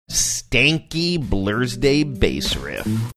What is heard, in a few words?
stanky bass riff